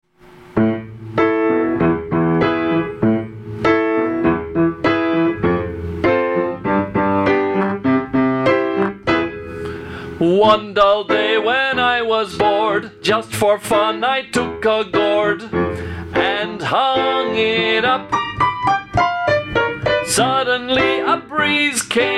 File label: gourd song